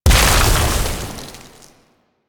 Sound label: Plunge Ability Ice Explosion; Enemies; Abilities; Warframe; Duviri; Duviri Dax; Dax Knight